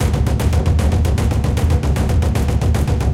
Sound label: explode drums loop; serengetikings